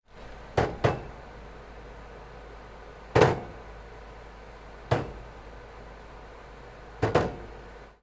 bowling ball return bump

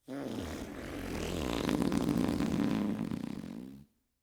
long fart

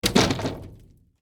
wood hit metal crash

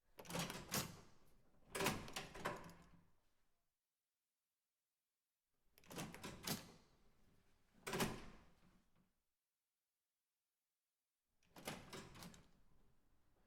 push bar door series

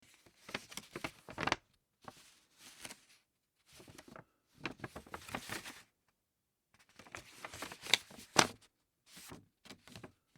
using thick paper

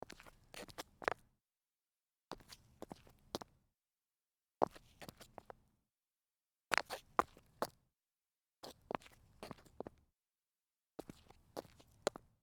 turnaround on concrete, foley

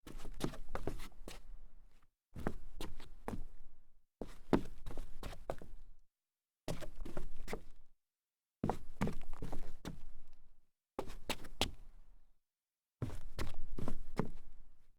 sneaker sidesteps solid wood

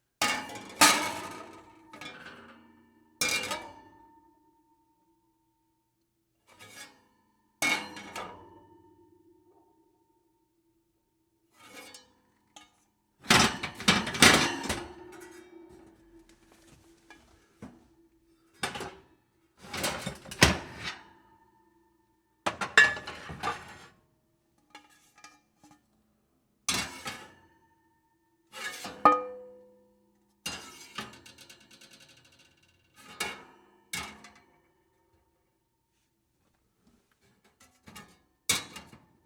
oven pans

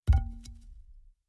wood bat falling in grass

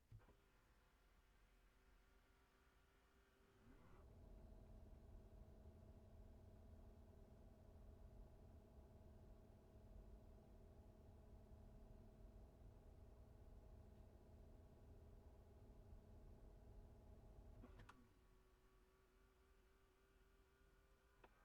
quiet whirring

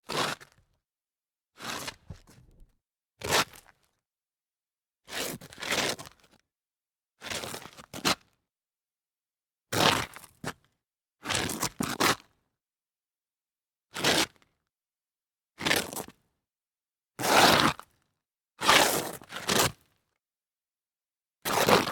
slide on rock series

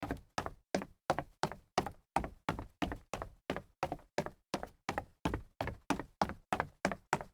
sneaker stairs walk jog, foley

